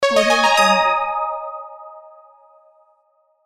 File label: notification sound